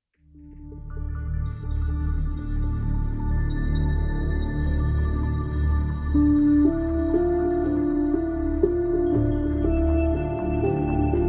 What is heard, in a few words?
autoharp